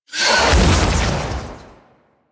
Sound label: Purgatory Ghost Possess; Warframe; Abilities